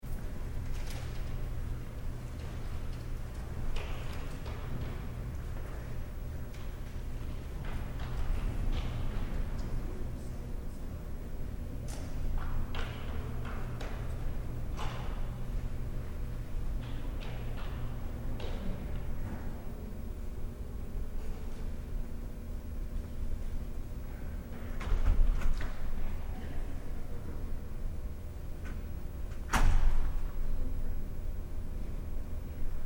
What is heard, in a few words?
Room Tone Library Lobby Airy Distant Elevator Slight Walla; Urban Spaces